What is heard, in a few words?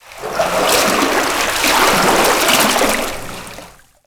Ocean Water Rising Laps; Ambience; Warframe; Grineer Ocean